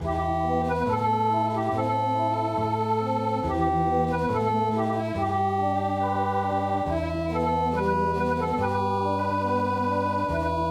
Snow Removal Song Okinawa

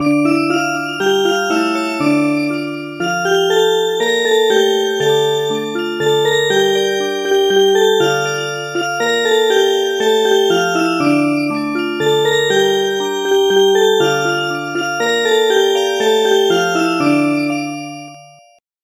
Music Box; A new joy has been revealed

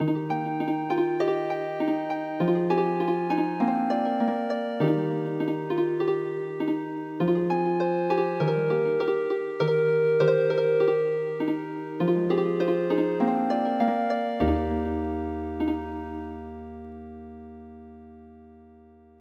dendera Healing Harp

